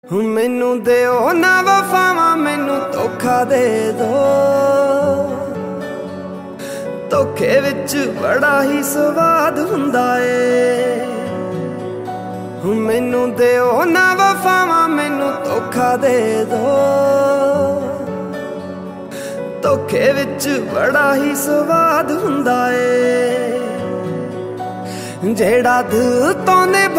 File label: Romantic, ringtone